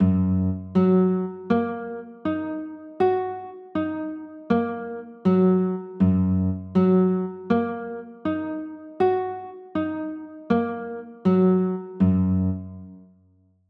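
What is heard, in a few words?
G flat 6 chord, guitar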